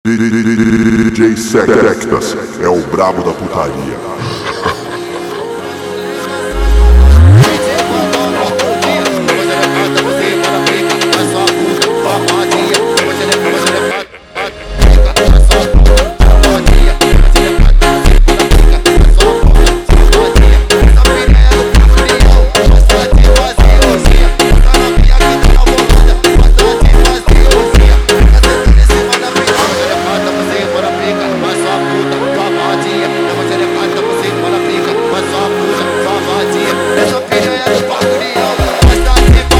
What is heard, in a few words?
Analog Funk